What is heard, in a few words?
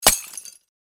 Lightbulb break shatter explosion